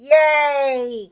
yay

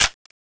mk46 box in

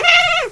cat pain

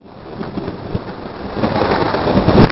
lightning powerup

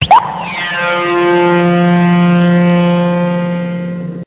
Power Blast

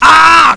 fly

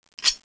dagger

